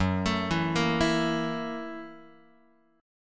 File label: F sharp 7th Sharp 9th, Guitar; arpeggiated; JGuitar